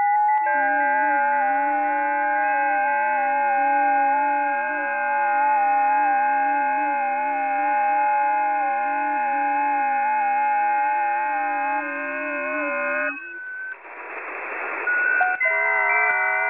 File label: websdr recording 7077.0kHz, hamradio